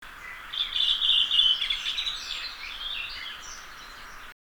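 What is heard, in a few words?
Turili, Redwing (Turdus iliacus); 13.6. Leppävaara. Varsin samanlainen kuin B-D.; Espoon - Helsingin raja-alueilta alkukesästä 2008; Linnut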